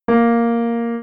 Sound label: medium melody start note